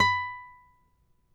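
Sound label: nylon, FLAMENCO, Guitars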